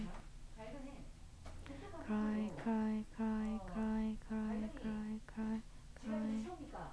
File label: cry